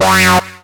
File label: Synth Shots; Vengeance Samples